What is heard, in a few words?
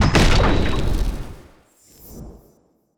Mine Explosion; Demon Minion; TANK; 3Souls